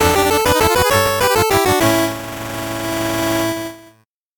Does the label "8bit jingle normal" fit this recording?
Yes